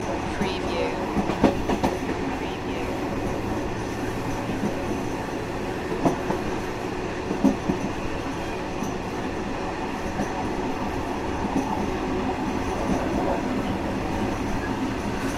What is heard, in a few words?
old train ride ambience; trains; vehicle transport sounds; subway; the sound pack tree